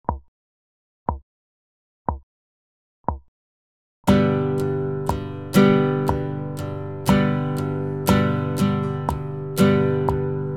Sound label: strumming